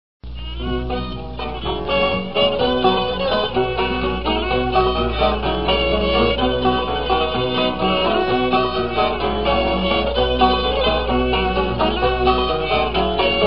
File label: Triangle Blues